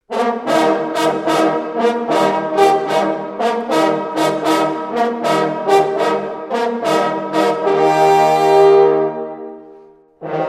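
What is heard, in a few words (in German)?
HASE TOT Jagdhornbläser; Schlanders; St. Hubertus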